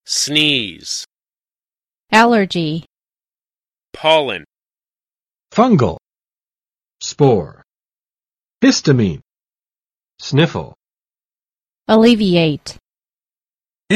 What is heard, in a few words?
sneeze; Hay Fever: Taking the Spring Out of Your Step 春暖花开，花粉症不要来; 打喷嚏